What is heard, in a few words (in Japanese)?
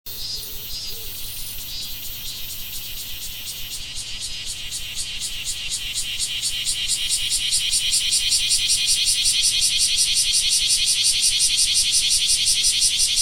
クマゼミ, 自然音